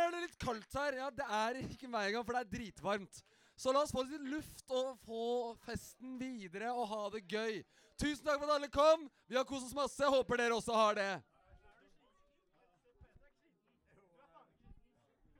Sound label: main vox